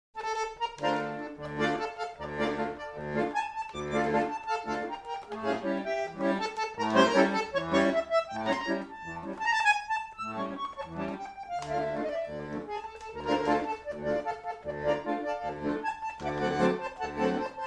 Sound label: Tango